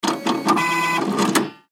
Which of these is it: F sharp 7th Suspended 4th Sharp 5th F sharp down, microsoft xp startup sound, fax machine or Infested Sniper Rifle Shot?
fax machine